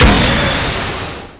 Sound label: missile launch; tinker